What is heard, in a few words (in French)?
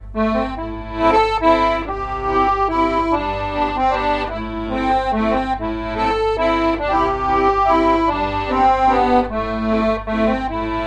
Valse des 20 pieds